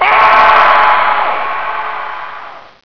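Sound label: Ship Explosion; Maelstrom 1.3; Ship Exlposion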